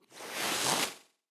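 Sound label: snake attack windup; En kasket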